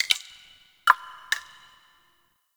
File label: Ocean Interior Drips; Warframe; Grineer Ocean